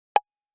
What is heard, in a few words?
timer Start